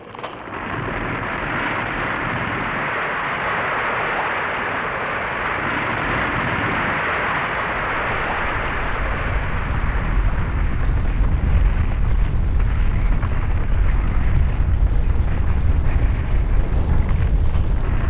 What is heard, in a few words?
avalanche; lurchvolution